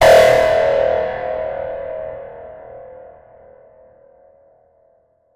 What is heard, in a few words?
sound design digital resonating ping reverb